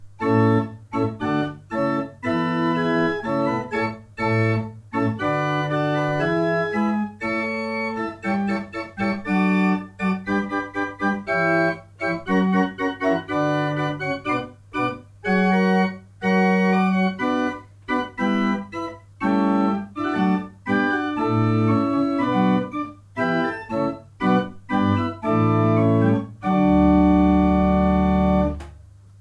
pipe organs; Verschueren 6 I

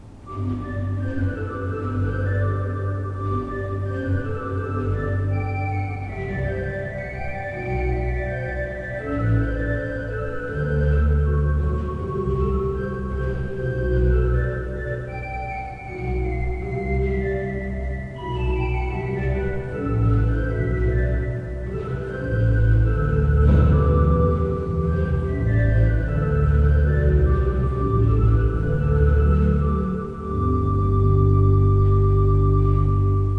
pipe organ; Seifert Koeln 32 III P

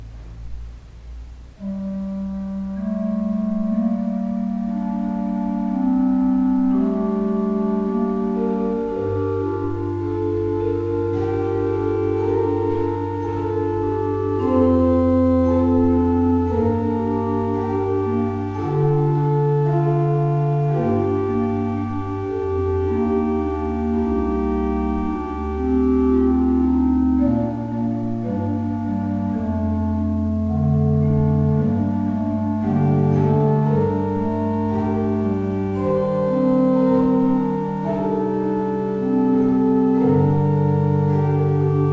Ott, pipeorgans

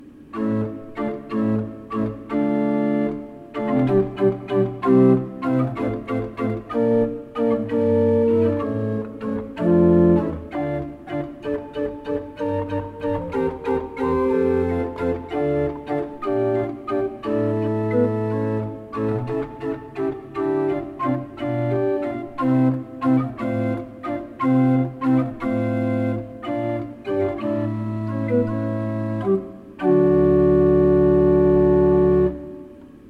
pipe organs; Offner

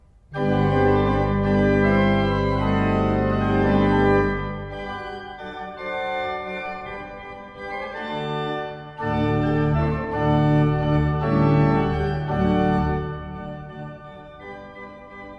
pipe organ

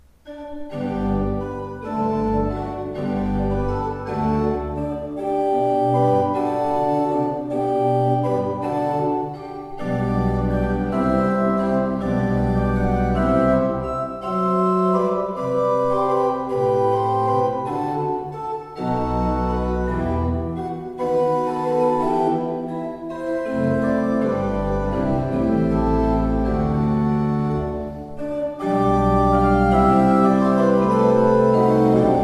Ott, pipeorgans

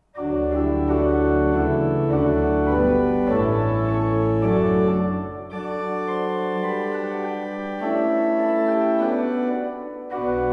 pipe organ; Schuke Potsdam 25 II P

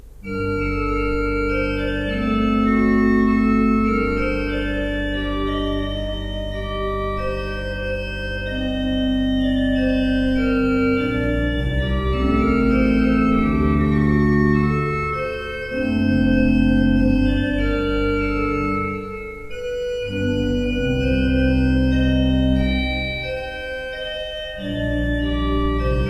pipe organs; Breil

